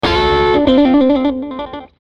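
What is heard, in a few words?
Musical Element, Drum Snare March; Blastwave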